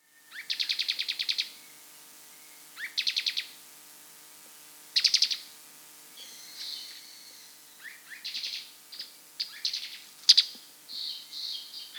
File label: Bluish-slate Antshrike (Thamnomanes schistogynus), Peru, Bolivia; S. America